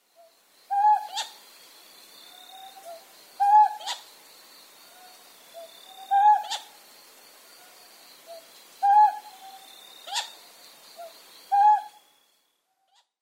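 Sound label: Ryukyu scops owl, ishigaki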